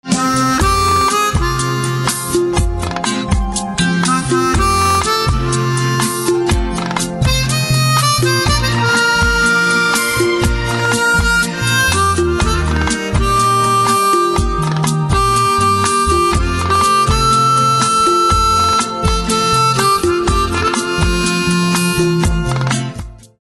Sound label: Harmonica